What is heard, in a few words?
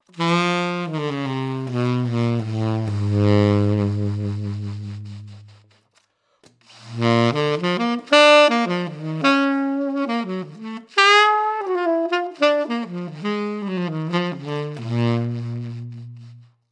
Ten bronze smooth; Venice bronze smooth